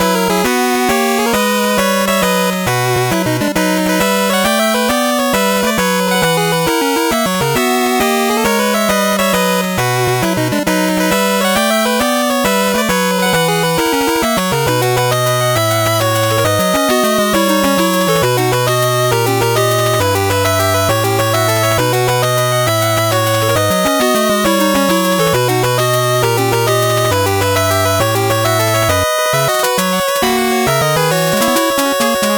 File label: 8bit bgm fast